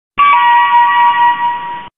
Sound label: Sony Aibo ERS 210 Hello Aibo Software Boot Sound